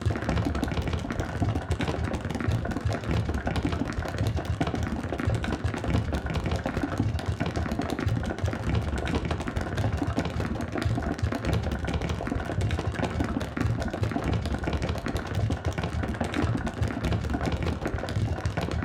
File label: ball bounce loop; powerball evo